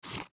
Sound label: Bandage Remove, Bandage Remove From Box Package; Hospital Sound Effects; First Aid; Emergency